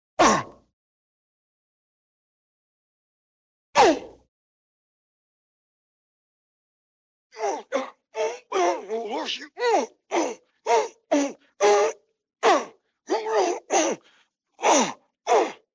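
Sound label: groaning, grumbling, wheezing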